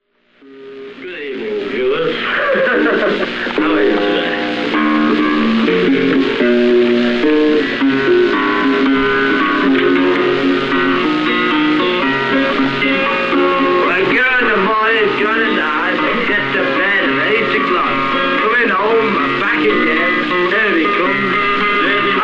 Guitar Blues; Artifacts Vol. 2

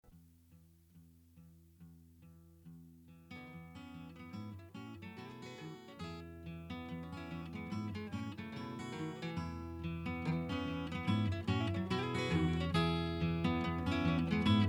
Instrumental

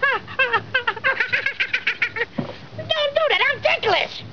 Tickle; Three Stooges

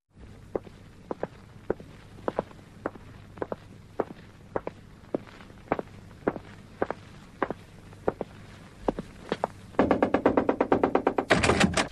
walk and knock door

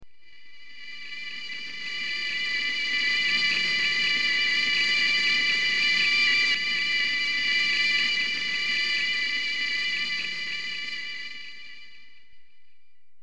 Sine Sound; Outer Limits

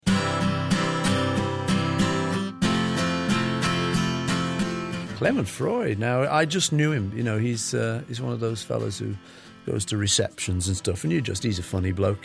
Strum Bit

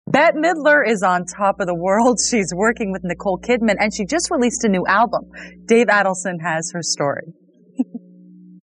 giggles

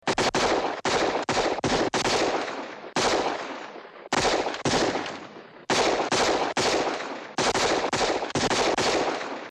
gunfire

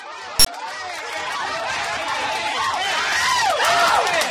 yay

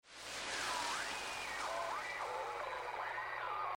Static

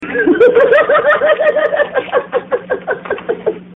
laugh; riddler

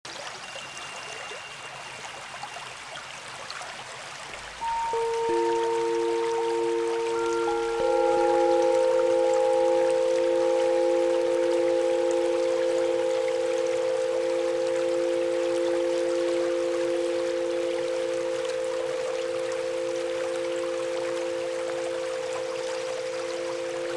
Music for Yoga, Meditation and Relaxation; Ambience

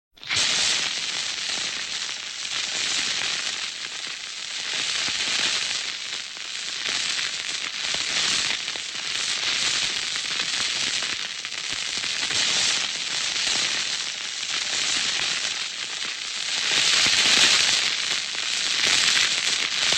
Fuses and Explosions; Halloween; Haunted House

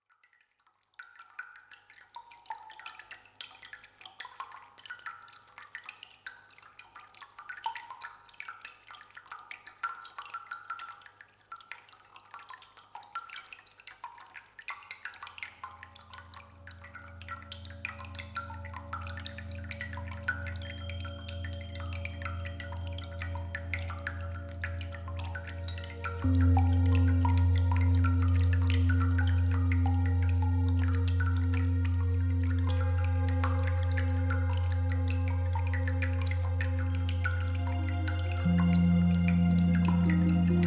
Music for Yoga, Meditation and Relaxation; Ambience

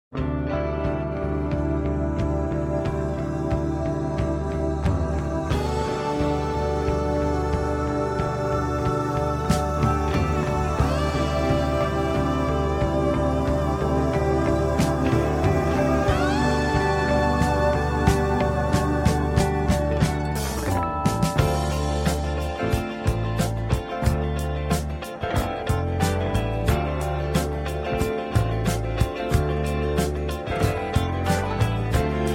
instrumental

